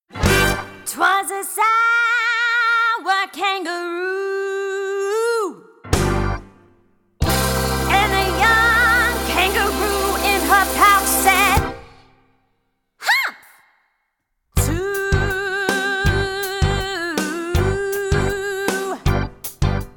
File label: Callback with Voices